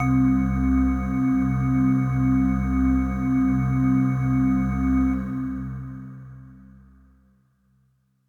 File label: Hello Kawaii C1